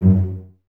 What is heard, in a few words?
CES F#2 Pizz, Cellos, String Ensembles; Miroslav Vitous